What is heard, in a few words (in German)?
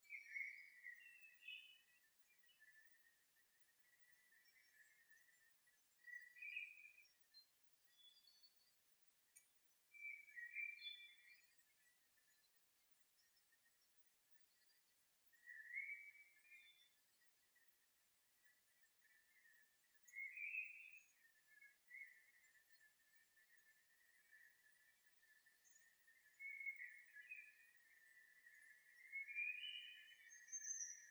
Lichtung Soundaufnahme Botanischer Garten Marburg